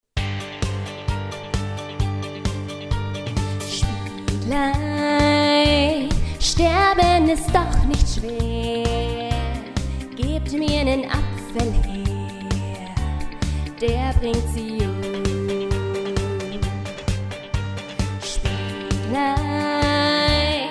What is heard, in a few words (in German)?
Spieglein Reprise Vollplayback; Schneewittchen